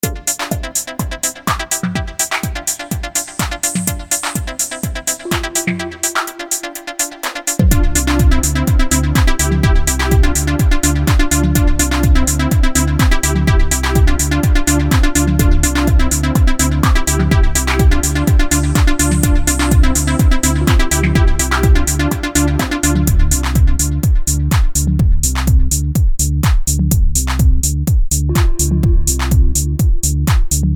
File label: Trance; samplefarmpro